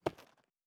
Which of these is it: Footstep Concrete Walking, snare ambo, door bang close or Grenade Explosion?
Footstep Concrete Walking